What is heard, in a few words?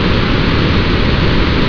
jet, thrusters; 16bit